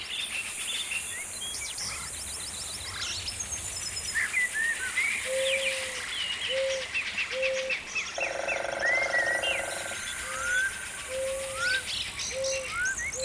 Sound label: forest